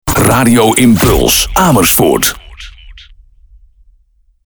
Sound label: Jingle; Radio Impuls Amersfoort